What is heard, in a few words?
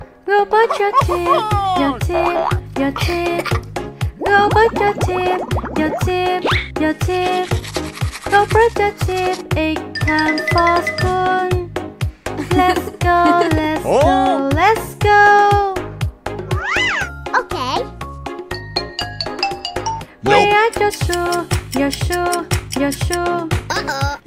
childish toothbrush song